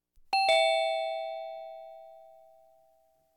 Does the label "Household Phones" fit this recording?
Yes